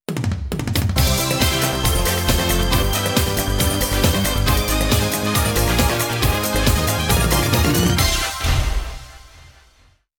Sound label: JINGLE; Acrobat Time